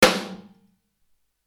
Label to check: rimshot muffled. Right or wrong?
Right